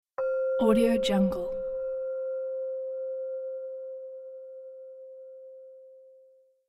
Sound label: bells sound